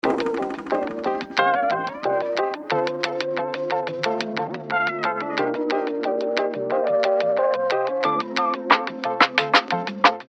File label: round ten sec count, music